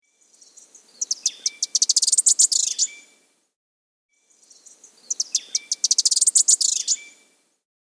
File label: Ash-Throated Bush-Tanager (Chlorospi; S. America